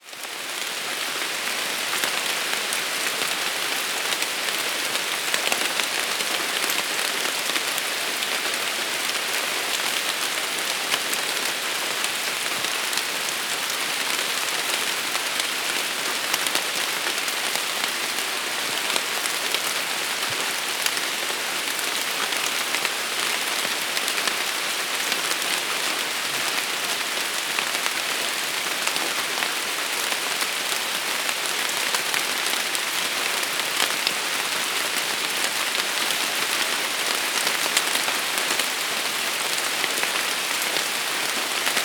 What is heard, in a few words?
Rain Through Leaves; Whalley Lake; Cottage Sounds; whispering landscapes